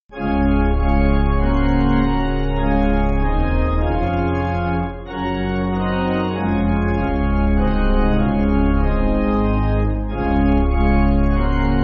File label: Pipe Organ, Music Backing; Gracious Spirit Dwell; Palgrave 7.7.7.7.7.7